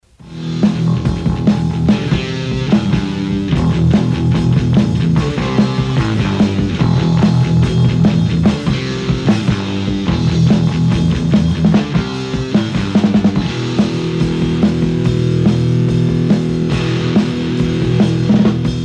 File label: Funky Metal; WXAC Reading PA 6-04-97